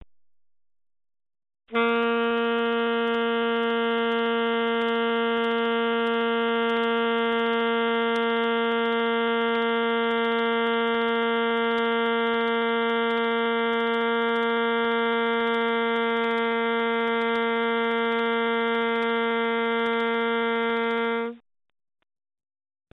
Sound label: long tone, sax